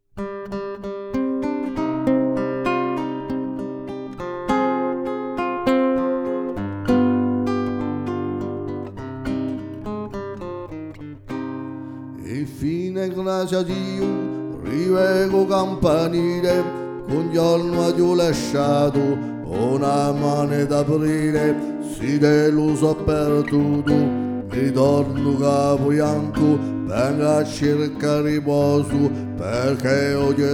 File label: RITORNU BASSE